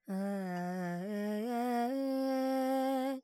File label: Pentatonic, Vocals, Carnatic Female, Alaps, Dry